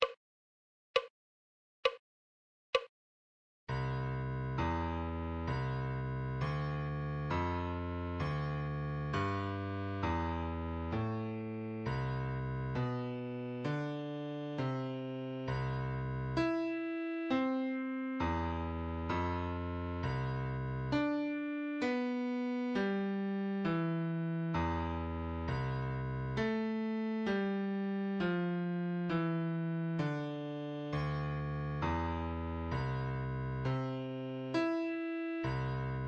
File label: musical reading in bass clef Adagio